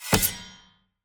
sword grab; player

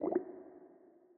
zone gas blop

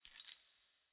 ambience room chain base

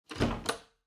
ambience room secret room chest

